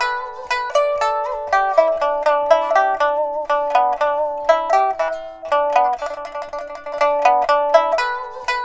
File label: PIPA, 90; INIS - Opium